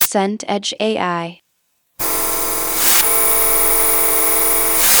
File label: noise white bed